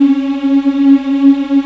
C#4, AF.VOWELS; Spectrasonics; Heart of Africa 1

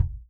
Real Drum Kit, ROCK KIT

